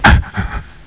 AHH; 3DGameStudio; Adeptus

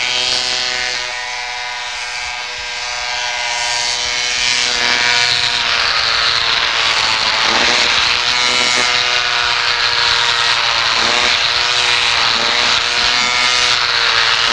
hedge trimmer; Sound Library 2000; Wayzata Technology; Household

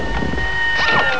swingin; carma

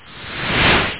Flame; wacky

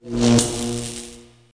unpowered

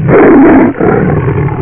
tiger; Thunderware Demo

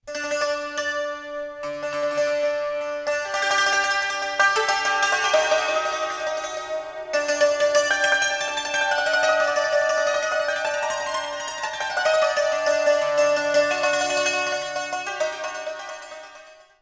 SANTUR

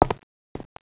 click

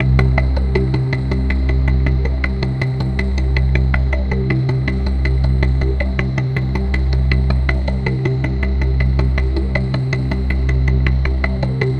AMBNT 160; Ambient

